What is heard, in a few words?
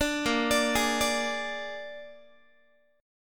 Bb 7th Bb down Guitar; arpeggiated; 17,18,17,18,18,x; 4A,4E,5A,5D,5G#,6C#